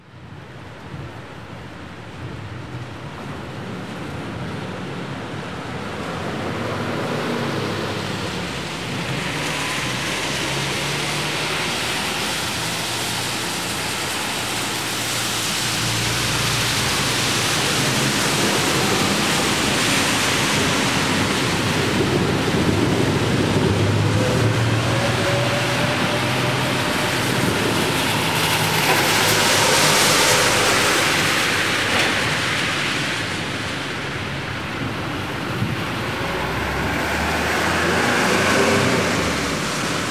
city traffic rain atmo, street traffic; best service Blue Box

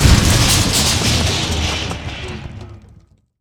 Explo Spaceship Impact Spark; Destruction

